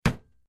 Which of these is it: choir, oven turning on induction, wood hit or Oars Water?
wood hit